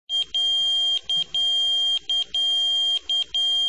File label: Firefighter Bip